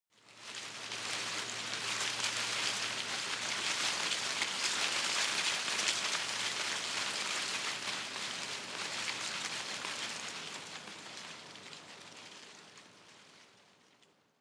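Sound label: tree small leaves rustle soft, garten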